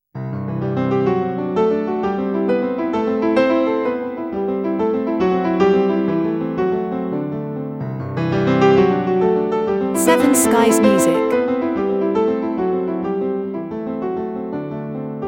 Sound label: Piano impression